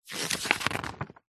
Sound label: household book thick heavy encyclopedia modern glossy page turn; zapsplat